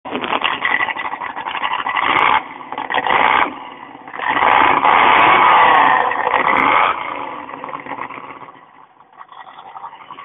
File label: trans am